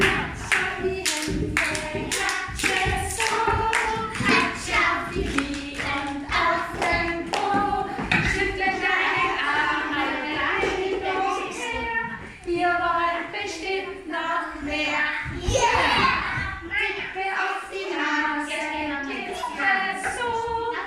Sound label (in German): Bewegungslied "Klatsch in die Hände"